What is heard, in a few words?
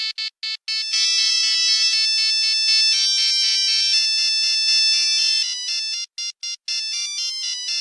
ring; squad ied